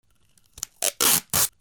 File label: FRICTION